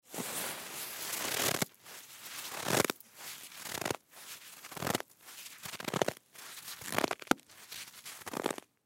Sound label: Snowball Make Grab Snow Form Snowball; Snowball Make Grab Snow Form Snowball Pack Hard Long; Ice (Sound Effects:Weather); Blastwave